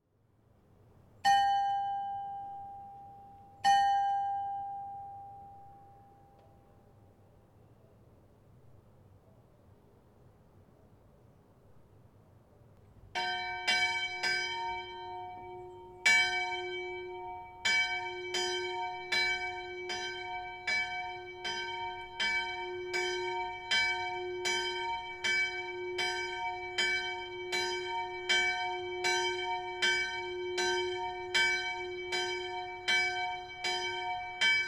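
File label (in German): Grosstissen St. Sebastian fis, Glocken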